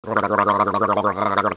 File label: gargle